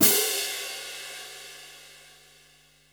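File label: cymbal orchestral open; 300 Drum Machines; Yamaha Motif ES-Series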